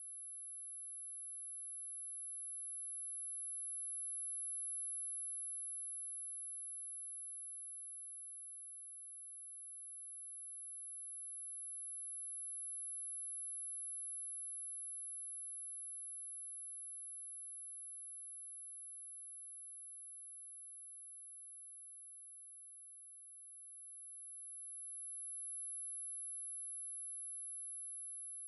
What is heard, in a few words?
Ringing Ears